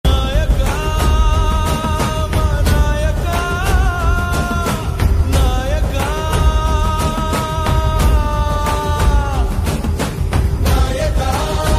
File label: emtional massive song ringtone; kanguva